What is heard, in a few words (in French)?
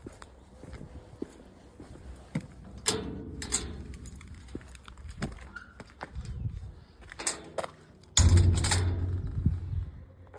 portillon entree, BRUITS DE PORTAILS; CELLES SUR BELLE (79)